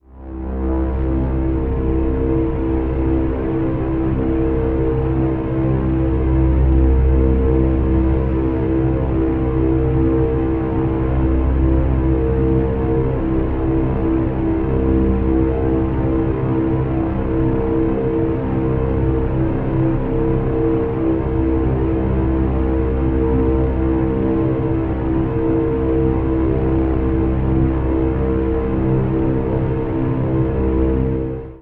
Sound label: Drone Indefinite C; Ghosthack